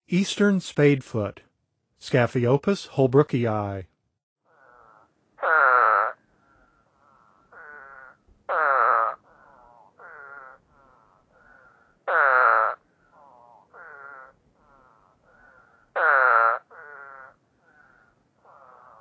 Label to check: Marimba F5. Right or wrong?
Wrong